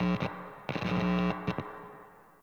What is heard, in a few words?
DJ Booth Amp Plug In; Warframe; Nineteen Ninety Nine; Hub; Hub Pub